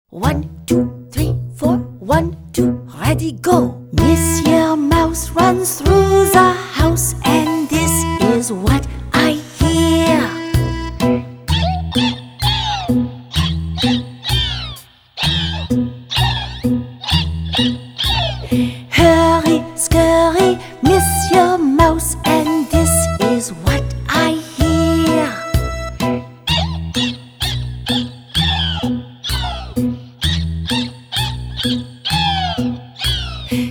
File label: mouse rhythms